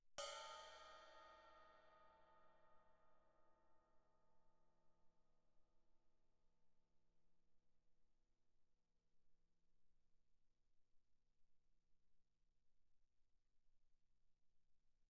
crash stick normal mf, suspended cymbals; Percussion

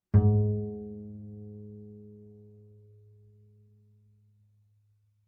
Bass pizz ff sul G Ab2, Double Bass; MIS Pitches - 2014; Strings

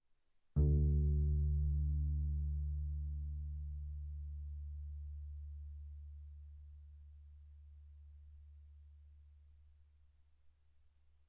Bass pizz sul D mf D2 B2, double bass

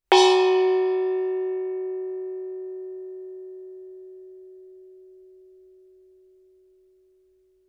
thai gong ff Gb4; MIS Pitches - 2014; Percussion

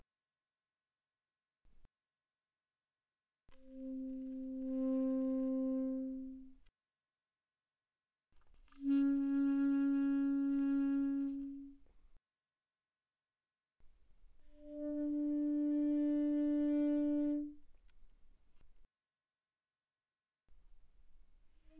Alto Sax No Vib pp C4 B4, alto saxophone

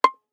Violin pizz ff sul D C6; MIS Pitches - 2014; Strings

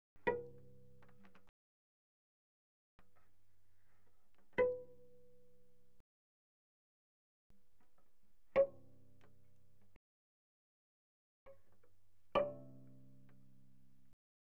Cello pizz pp sul D Bb4 D5; Strings

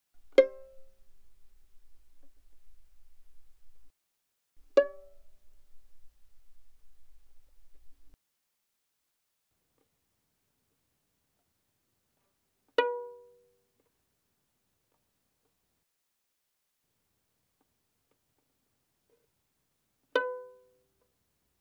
Violin pizz mf sul D C5 G5; Strings; violin2012

